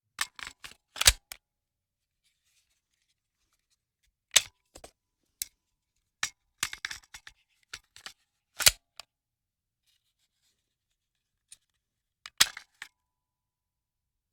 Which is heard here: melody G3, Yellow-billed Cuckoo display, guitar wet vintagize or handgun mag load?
handgun mag load